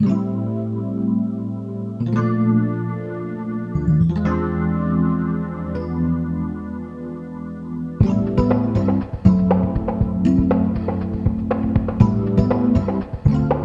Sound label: Nice electronic piano work & layered vocals